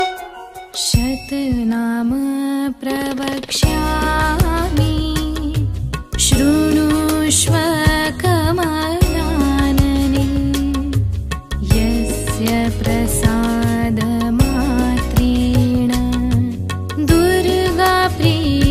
Durga Stotra, Durga Namavali, Durga Maa Devotional